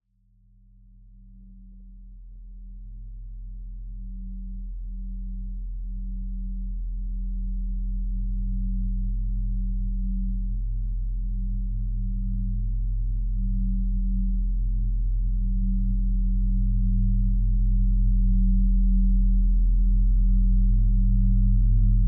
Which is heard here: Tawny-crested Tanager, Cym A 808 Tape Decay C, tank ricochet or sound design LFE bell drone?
sound design LFE bell drone